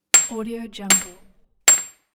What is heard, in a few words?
hammer hit; robertbushmanfx